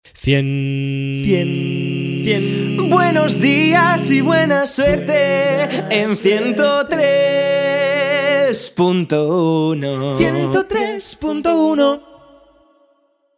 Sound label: spanish singer cantante dial radio cantado; cantante y compositor. Melodía e indicativo radiofónico del dial 103.2